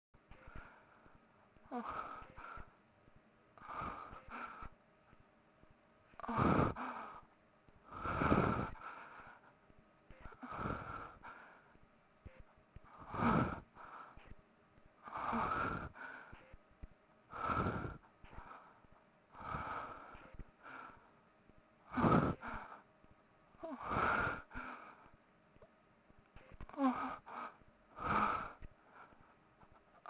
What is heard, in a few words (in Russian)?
zvuki zhenskikh stonov